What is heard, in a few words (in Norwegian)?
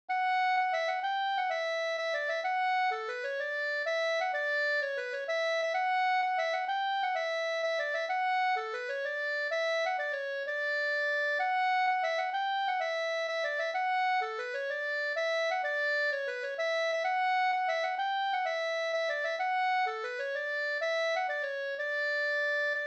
pols Rorospols; e Krestaffer Troen no